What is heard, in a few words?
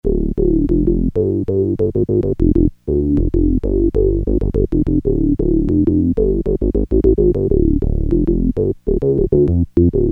Bass - Synth Bass, Crumar Trilogy